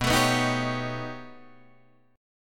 B Augmented 9th B down Guitar Open D

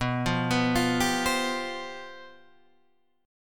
C Major 7th Suspended 4th

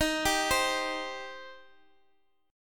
D sharp 6th, Guitar, DADGAD

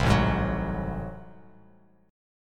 A Minor Major 13th A, Bass Standard; strummed; AmM13; 8,0,6,11; JGuitar

